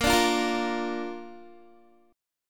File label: A sharp Major, Guitar; strummed; tuning 4E,4A,5D,5G,5C,6F